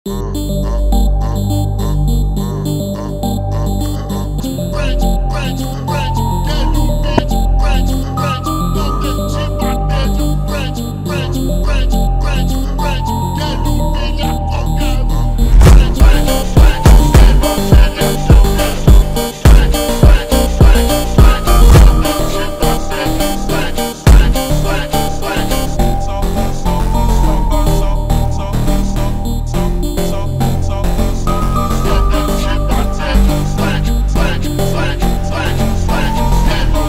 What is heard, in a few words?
Phonk Slowed